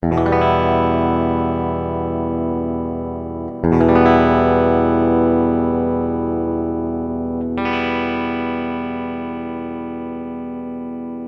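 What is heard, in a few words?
clean electric guitar dry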